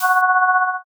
shield up

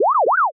scratch

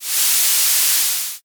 snake hiss

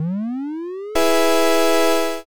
summon

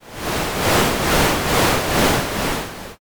murmur

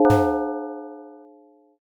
prison door

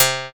slap bass

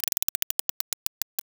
roulette

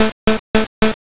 chew

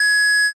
censor beep

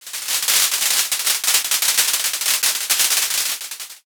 ovation

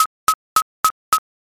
knife

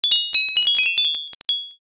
sparkle long

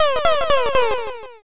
witch laugh

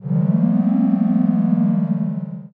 ooh

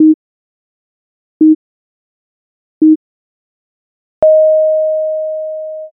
jiho

